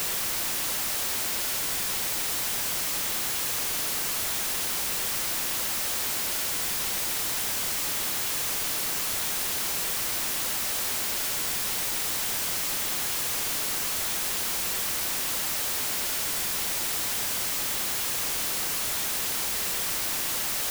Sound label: White Noise Static; GlitchNoise